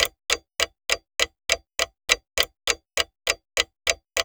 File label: clock